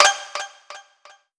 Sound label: Se Btl 10sec